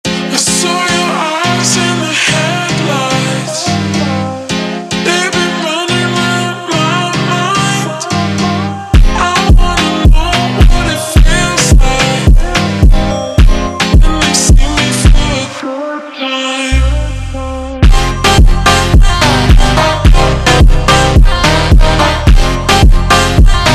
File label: Montagem super Slowed